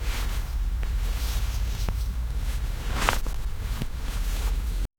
light cloth rustle as gol; fabric

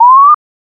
short exciting, objective

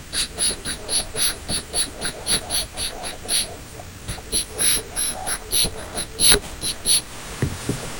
the cockroach is coming; animals

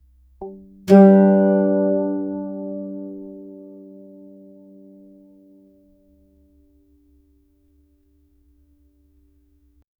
pizzciato strings

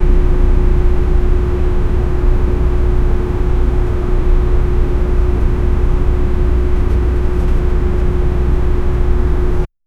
reflective and, neighborhood

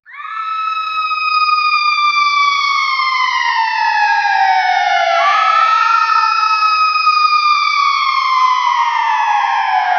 kids screaming layered, emergency vehicle

